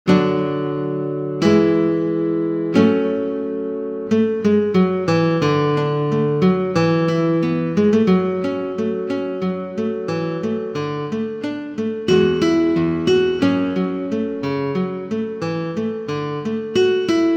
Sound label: synth